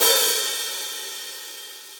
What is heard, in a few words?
Hihat Open Shank Session Dry; Drum Hits; Standard Hits; Ableton; Factory Packs; Session Drums Studio